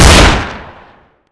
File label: barrel, shotgun